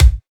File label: crispy kick drum single shot a sharp key, bass drum sample tuned to the A# no